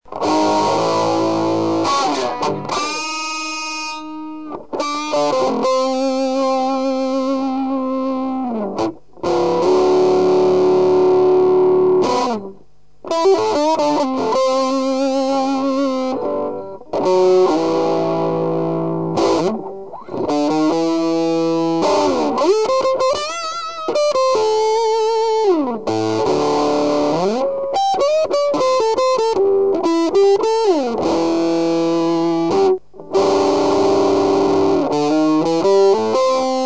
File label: tube fuzz